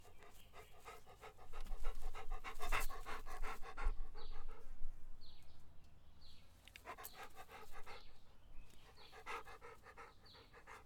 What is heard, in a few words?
Dog Panting